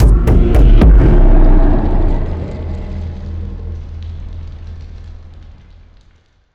Arena fail

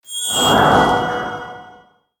Skill Support elemental

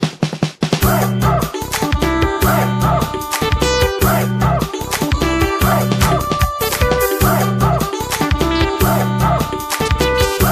Jingle Setting; Taiko no Tatsujin DS Touch de Dokodon

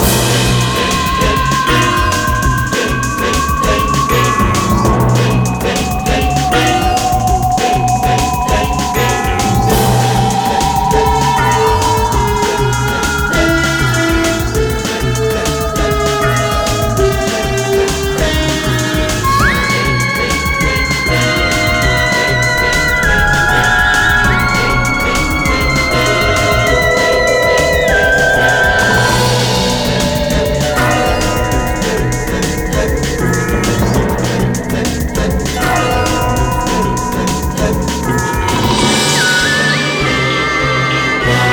Music - Race Loop; Astrolab3

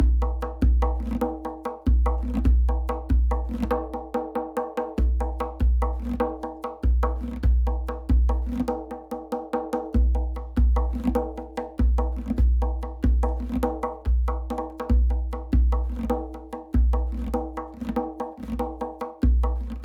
Tombak riff; raji an ancient epic

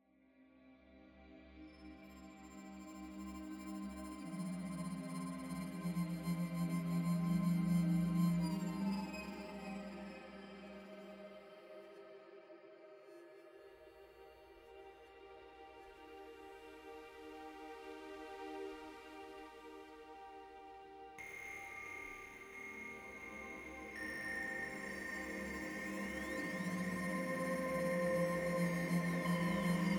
Explore Ruins Electro

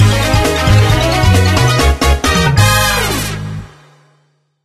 Jingle; Kemono Friends Pavilion

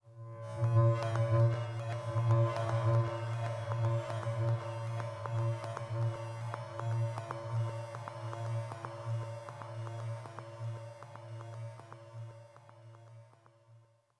BGM Lobby; metal mind; xbox series xs

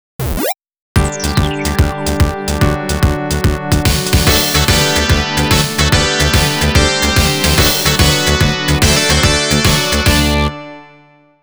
16bit start

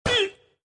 Pain hit guy; iStunt 2

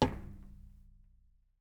glass land; teardown; ps5 windows xbox series xs

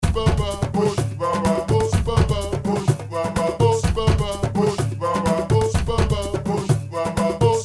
Logic Loop

